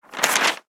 Periódico cerrado

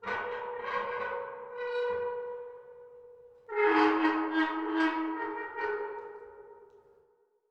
Sonido metalico

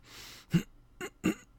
forcejeando

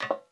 Zapato tacon escalera metal; Yesterday Origins

